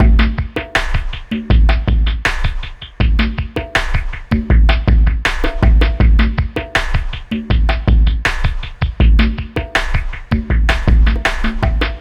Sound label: Brazil Dream, ambient